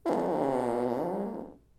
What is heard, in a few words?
room echo